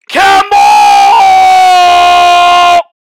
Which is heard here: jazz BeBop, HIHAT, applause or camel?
camel